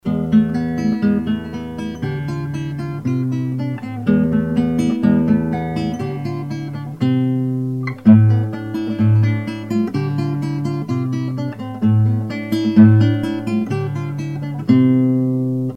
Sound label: Wind